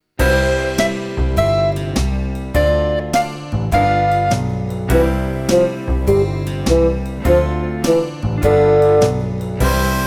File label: Harmonica The Muha Song to listen to, blues harmonica